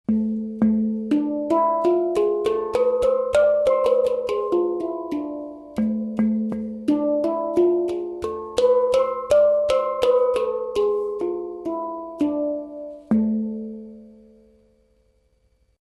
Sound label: hang